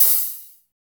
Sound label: HI-FI OH, HATS; Drums In Your FACE!; BONUS HATS